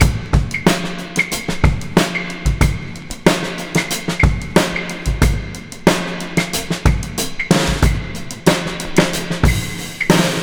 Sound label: Break Claves 92 bpm, Drums; Loops; Ableton Live 12 Lite; Core Library